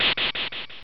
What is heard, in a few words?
Whip Upgrade